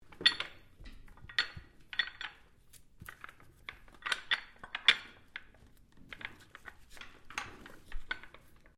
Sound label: BLOCK TACKLE MOVE, Sounds onboard HMAS Castlemaine; Boats; Vehicles